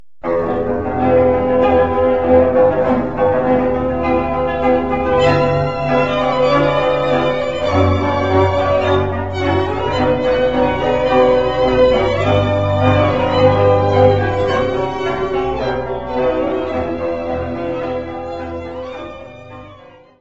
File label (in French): Hongrie